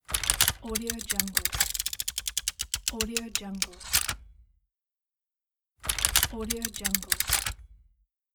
mechanical movement

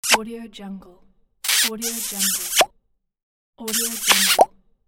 kiss pops

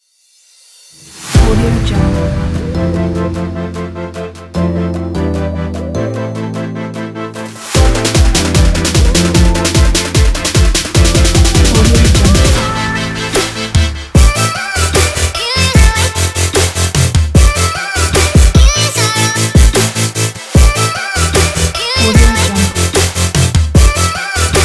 the future electronica

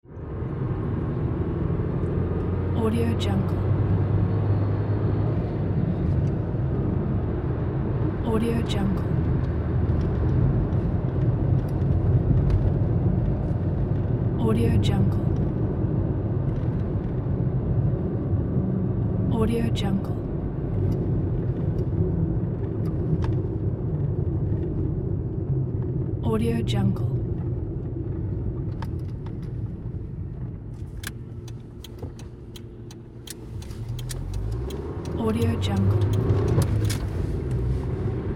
in car

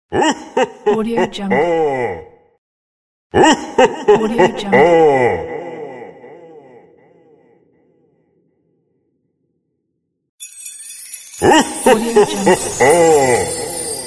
santa laughing hohoho

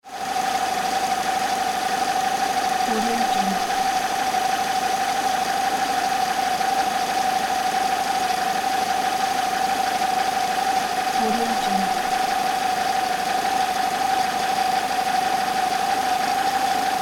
car engine idle working

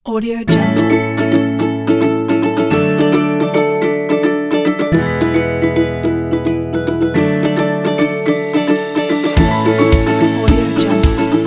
funny acoustic summer vlog

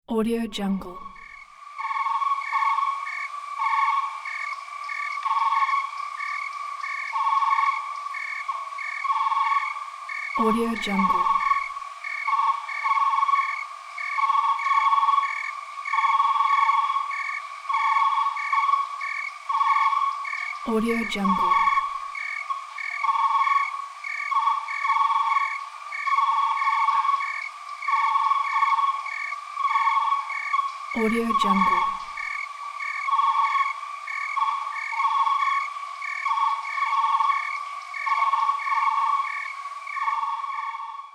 night crickets

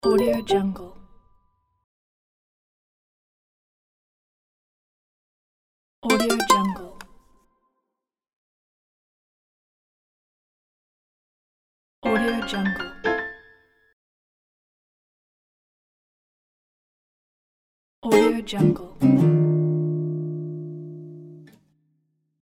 musical interface

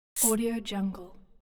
shaker percussion instrument

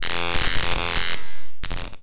electricity arc; soundmorph

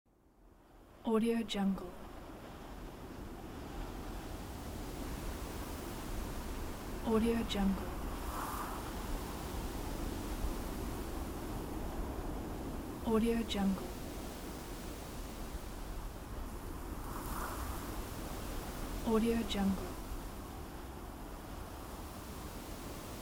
light wind

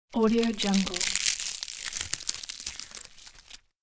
crushing dry plant leaves; fxprosound

